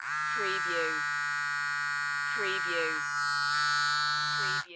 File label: Cell Phone Vibrate